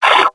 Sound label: kick; combine soldier; hl2